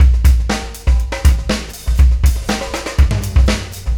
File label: funk